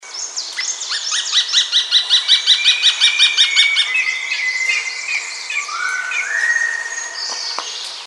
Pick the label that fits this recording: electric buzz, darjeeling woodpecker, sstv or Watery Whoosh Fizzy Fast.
darjeeling woodpecker